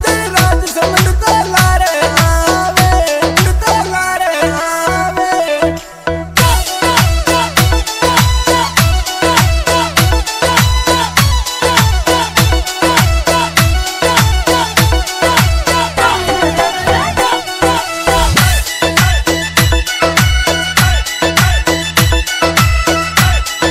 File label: rajasthani dj ringtone